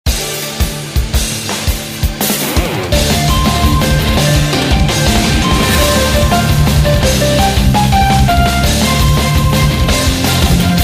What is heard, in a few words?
Battle BGM